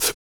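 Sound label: vox percussion, Vocal Planet Jazz FX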